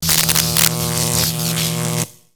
Robot Electro Arcs; creatures mechanical; Lego

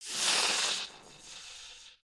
baby spider idle; creatures mechanical

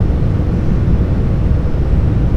Refinery Big Ambience

